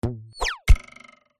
cartoon arrow bow release whizz by hit target with twang